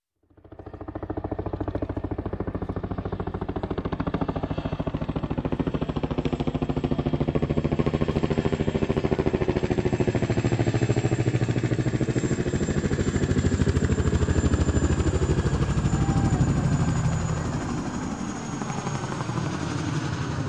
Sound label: Helicopter Chinook Pass Overhead; Sound Ideas 2000 Series